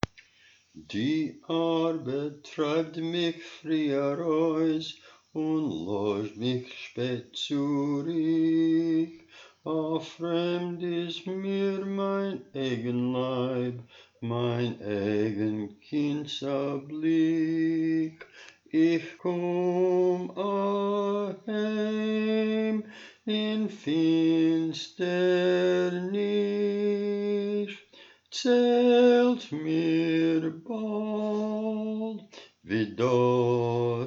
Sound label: Bass; Mayn Yingele